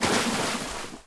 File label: splash; aviamaster; Aviamasters